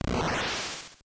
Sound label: airship moves; smb3